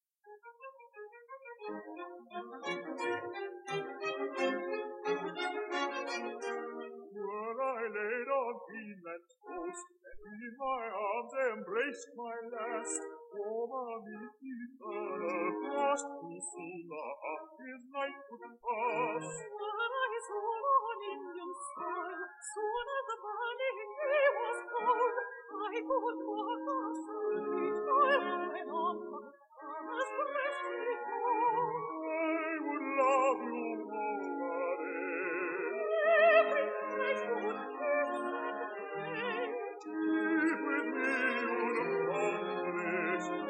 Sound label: air